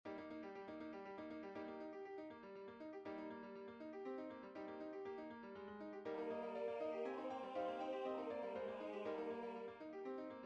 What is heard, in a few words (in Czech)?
tenor; faure3 sanctus tenor1